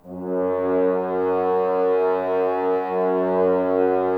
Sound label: Brass F.Hrns